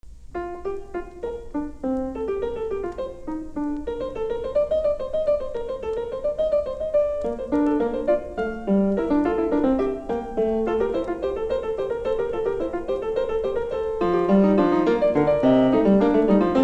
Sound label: fugue In B Flat Major; Bach; WTK 1; Glenn Gould